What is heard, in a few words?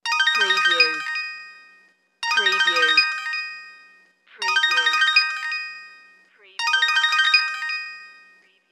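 Cell Phone 4 Rings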